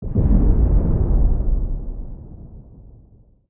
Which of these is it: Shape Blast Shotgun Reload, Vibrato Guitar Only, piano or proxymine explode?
proxymine explode